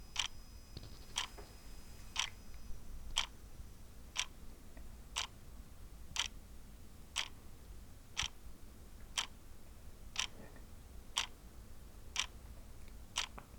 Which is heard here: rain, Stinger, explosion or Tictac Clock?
Tictac Clock